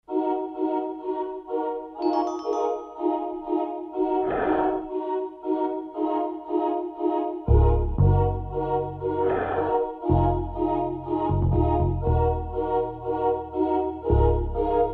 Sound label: Slow, Dance